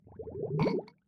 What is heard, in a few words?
water sym idle anim; elements